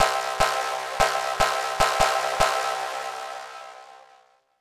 Overlay Dark; Benefactor

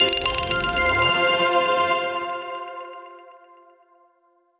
lvl up; ludoria windows gamerip 2017

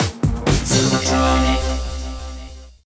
Sting Battle Completed; Zubo; NDS

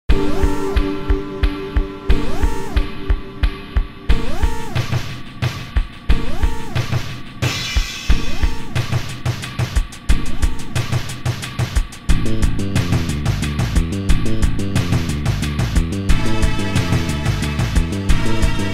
stinger

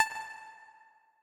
Tessellation Move C

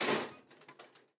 metal break; teardown; ps5 windows xbox series xs

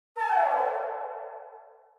Flute Failure; hack and shoot heroes

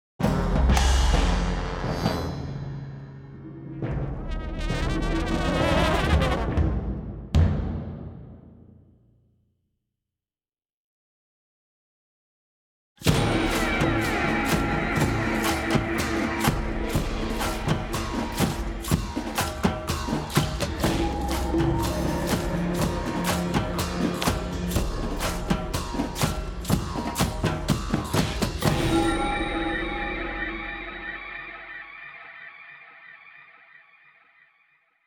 Sting And Loop; Baby Wendigo Abducted

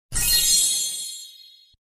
Sfx Item Heal

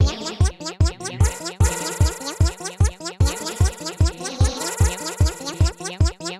Clockwork Machines B - Human

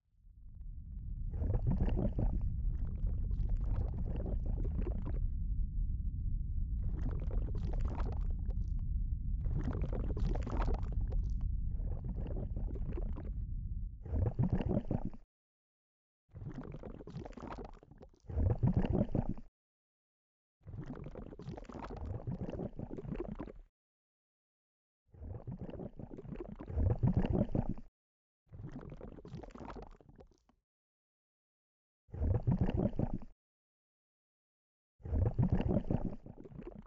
Ambient Lava Pits; Star Fox Adventures

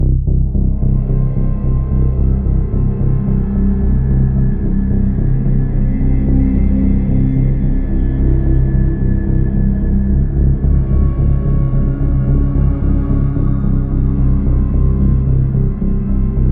End Music; The Fall

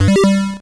character select sfx; appraiser

